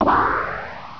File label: delete